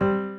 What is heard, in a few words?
piano